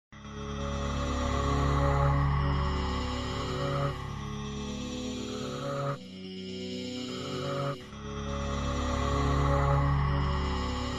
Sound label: conversation loop theme; dues ex nyc